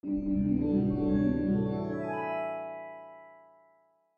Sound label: c chord; matching game